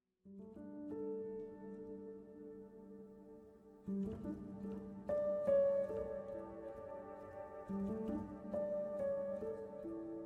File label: piano miniatures